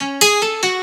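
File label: Instrument Guitar C minor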